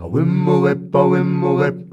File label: "awimba" F, MALES; Best Service; Hyper Dance